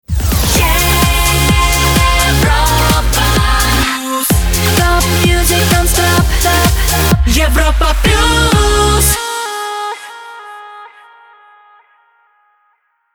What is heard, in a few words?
Jingle; Europa Plus; Shotgun